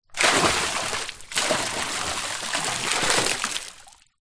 nature water splash movement pour into deep water; zapsplat

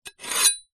kitchen knife large scrape on metal; household

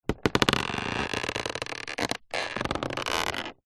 plastic creak squeak; foley

